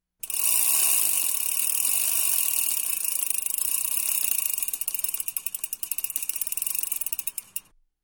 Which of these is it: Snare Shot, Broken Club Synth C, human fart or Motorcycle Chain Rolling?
Motorcycle Chain Rolling